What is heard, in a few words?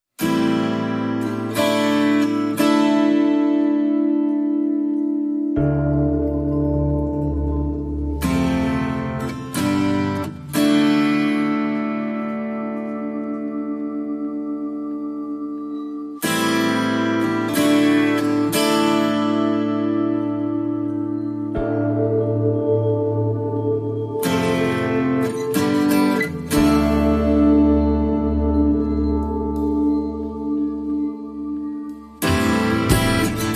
No Lead Guitars